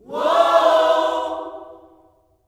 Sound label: WHOA-OHS, Vocal Gospel